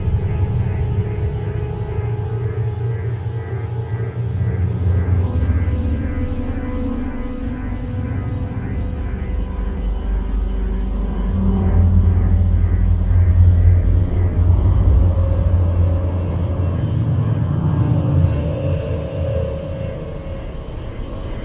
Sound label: dissonant spaceship interior loop; LOOPING AMBIENCE